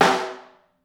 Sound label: SN2 Room, snare; Ableton Foundation FreePacks; Snare Designer